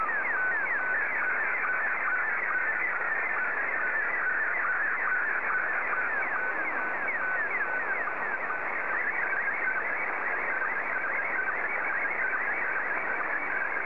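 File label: SSTV, Scottie 2; JA3QJQ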